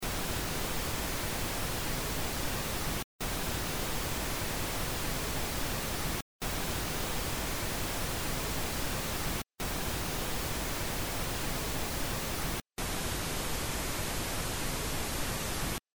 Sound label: Pink Noise All